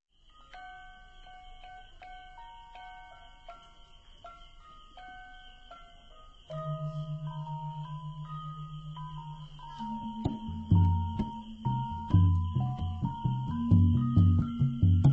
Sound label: Gamelan Semarpegulingan; World Sounds